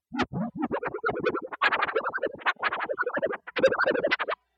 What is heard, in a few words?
scratchy